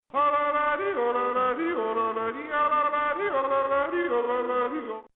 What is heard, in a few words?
Yodel